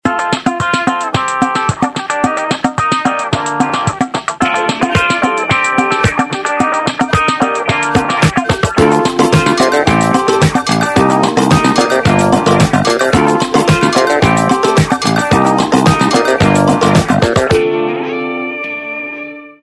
Disco Walking; Samsung; SGH-A177

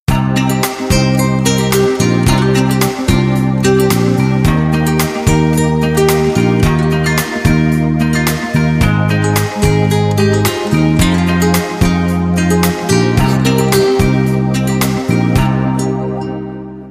Nokia Butterfly

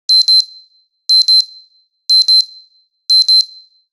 Alarm Beeps; HTC S740

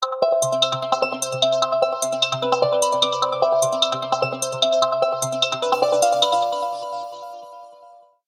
metro, alarms

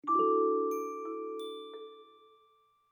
bootup; Yota Yotaphone

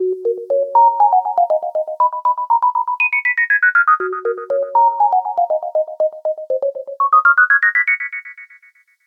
Default Ring, ringtones; SKY IM-A850S-VEGA-R3

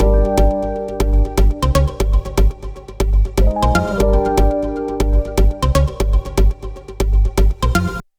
techno, alarms; Orange Sanza

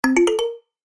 Spining, notifications; Meizu X8

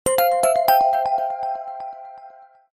The North Star, notifications; Meizu X8

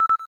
cam timer last; LG; Qua tab PZ LGT32

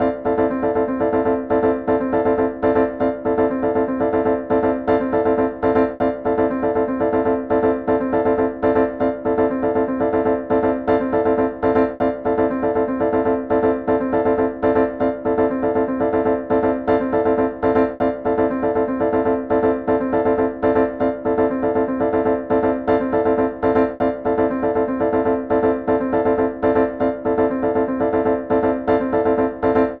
call mail; HTC; Infobar-A02

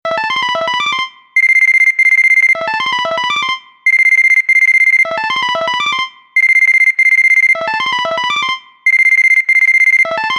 phone tone; LG; BL40-New-Chocolate; The Electronic War

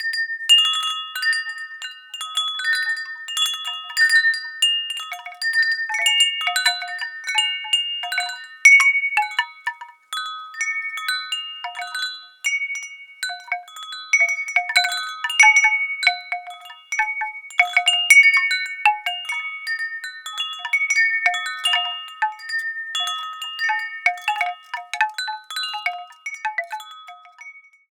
Garden breeze, Natural Elements, ringtones; Google; Google Sounds

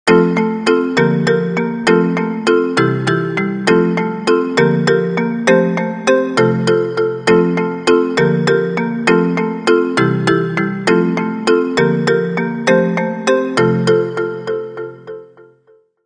Wonderful alarm; Sony Ericsson; K770i

